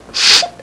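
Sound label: SNIFFLING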